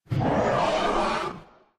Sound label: spinosaurus death; paleotest